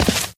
white tile, footsteps

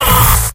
pain sharp; scout; source engine; tf; mvm

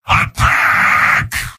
soldier battle cry; mvm

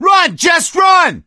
yell run, gambler, survivor voice; source engine; left 4 dead 2

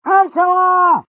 cheers; pyro; source engine; tf

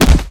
vort foot; npc; source engine; ep2

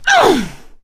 melee swing; source engine; left 4 dead 2; producer

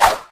swing; taunt; yeti; pyro; source engine; tf

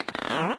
mystery maximize, windows 9x sound schemes

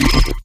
object use, player

